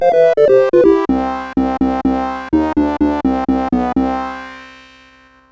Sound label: CSG8580